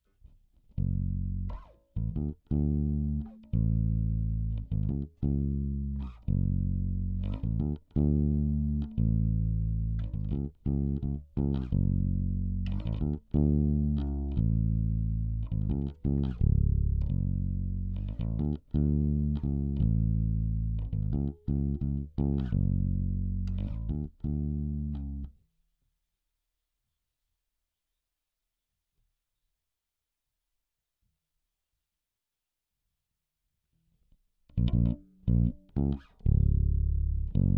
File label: Bass DI